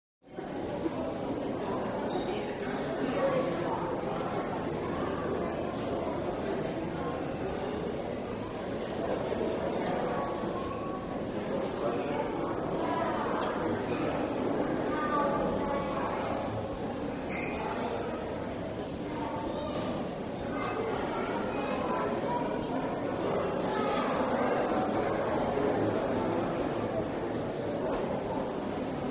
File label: Museum Echoey Walla, museum